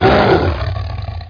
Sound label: monst